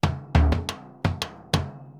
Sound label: Bombo, Festa Latina, Drums & Percussion; Best Service; Festa Latina VOL-1